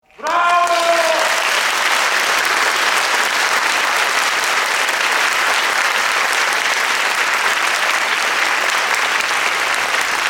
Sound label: clapping bravo